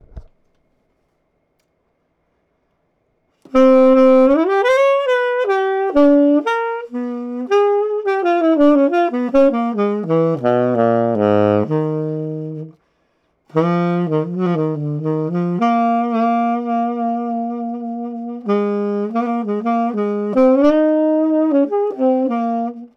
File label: horn